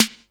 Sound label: SD, Dance Mega Drums; Best Service